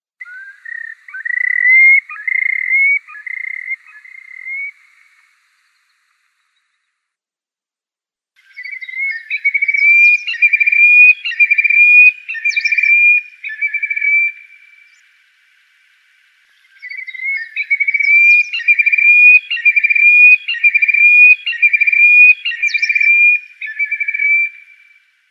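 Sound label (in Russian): Morodunka, terek sandpiper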